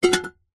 household medium small sized metal stockpot cooking pot empty pick up from hard surface